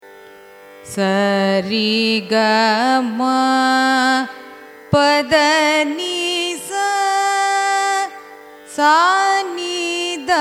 raga natabhairavi arohanam avarohanam, carnatic music